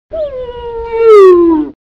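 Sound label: sound of camel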